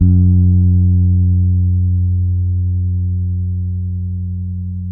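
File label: G 3, dark fretless bass; Spectrasonics; Bass Legends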